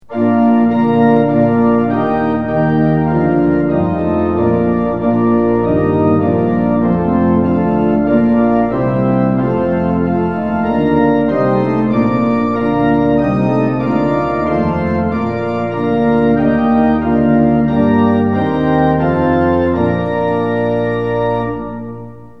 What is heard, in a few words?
tune; hymns; lillenas